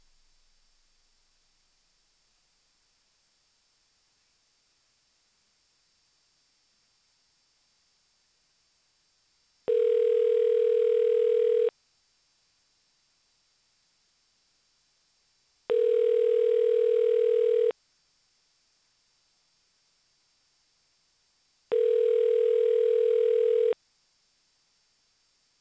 Ringing Tone Answering Then Hanging Up

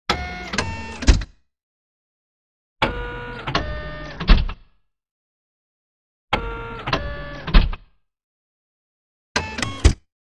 Tripod Leg Step; Wotw 2010

